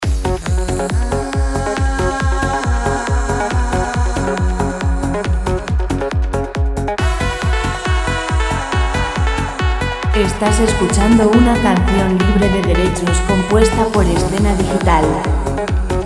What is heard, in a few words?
138 vocal dance royalty free music; background music